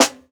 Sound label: Snare, jungle breaks drum sounds